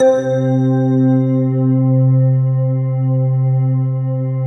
PAD, Pads; Best Service; XX Large

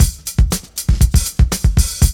LOOP; E-Lab; X Static Goldmine 2; akai

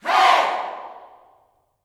HEY, Vocal Planet Gospel YELL SHOUT; Spectrasonics; Vocal Planet Vol.1 Gospel; akai

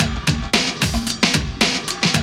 LOOP, DRUM; E-Lab; X Static Goldmine 2

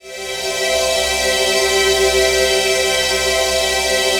PHASE PAD, ATMO PADS; Best Service; XX Large

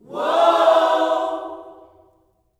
WHOA OHS, Gospel, Vocal; Spectrasonics; Vocal Planet Vol.1 Gospel

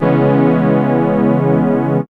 SYN STR

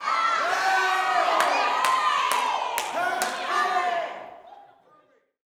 Yells, Vocal Gospel; Spectrasonics; Vocal Planet Vol.1 Gospel; akai

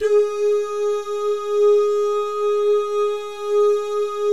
"DOO" GUYS, Vocal Jazz Fx

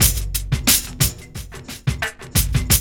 LOOP; E-Lab; X Static Goldmine 2

